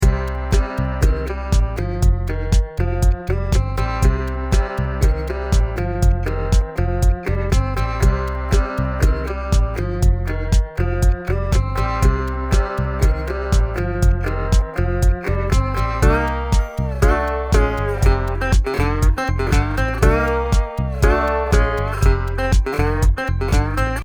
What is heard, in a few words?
Sliding Guitar; Ringtones